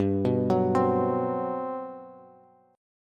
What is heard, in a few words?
G Major 11th, Bass; arpeggiated; JGuitar